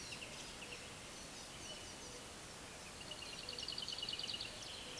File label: Sylvia curruca